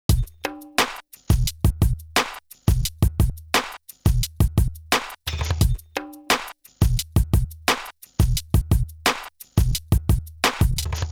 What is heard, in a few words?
Fet III Loop Comp; Daking